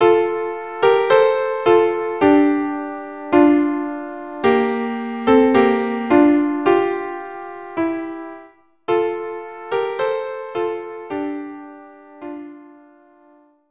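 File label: violin viola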